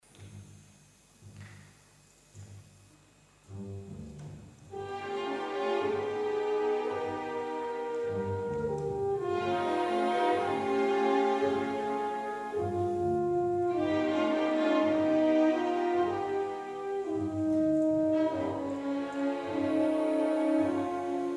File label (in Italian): Concerto Per Sax E Orchestra